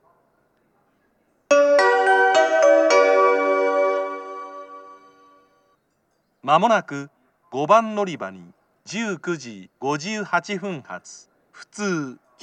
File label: amagasaki local, station; jr-west; kyoto